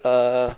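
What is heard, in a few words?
"uuuh", conversational grunts in English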